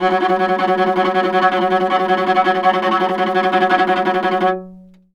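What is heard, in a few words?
VIOLA tremolo F#2; Orchestral; DirectWave; Varazdin Orchestral; Viola II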